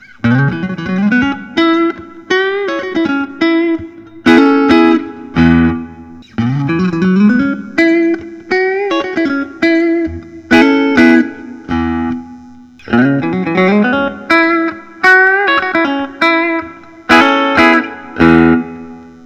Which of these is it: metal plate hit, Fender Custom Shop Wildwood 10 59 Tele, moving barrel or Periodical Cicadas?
Fender Custom Shop Wildwood 10 59 Tele